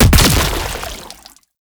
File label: Grenade Explosion; grenade launcher; weapons; Scaldra